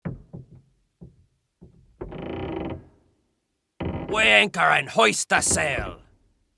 Weigh Anchor

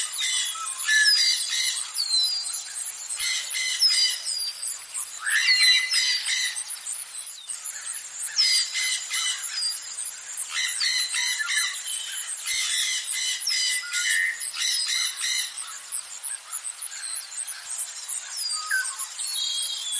birds morning; Nature

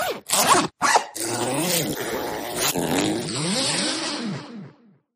kettle boiling; Foley

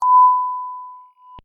modulated censor beep; DailySounds

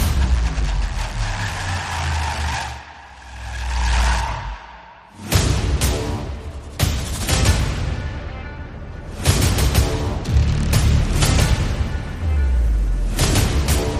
drum kit loop; Music

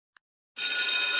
chime soft

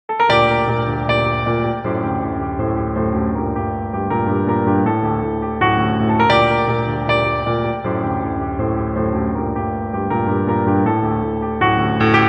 Russian Classical Piano; Music

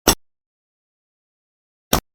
jackhammer single impact variations; DailySounds

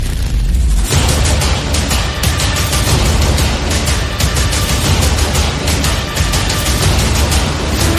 deep bass drop; Music

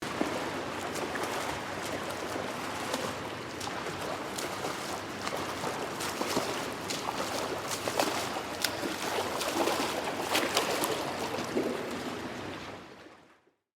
swimming pool trap splash; SFX

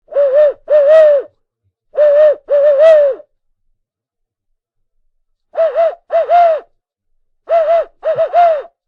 owl call device

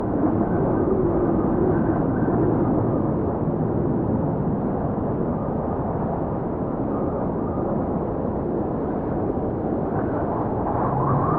eerie silence; Horror

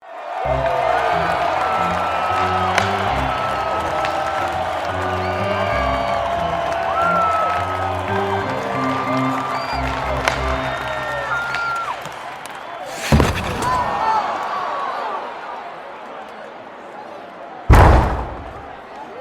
hockey game ambiance; Ambient; DailySounds

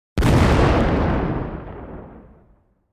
nuke explode dry

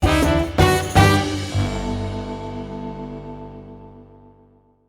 happy ending sax; Music

